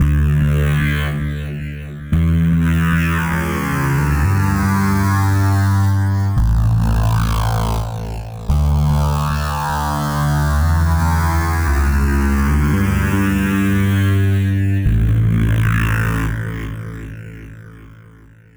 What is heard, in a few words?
weird bass, 113BPM; Pony Fan Music Remix Stems